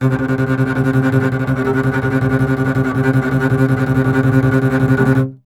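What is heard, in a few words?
string contrabass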